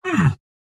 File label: AHurt